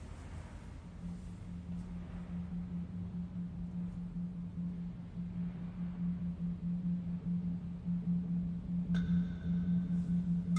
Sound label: verby humming space engine; Atmospherics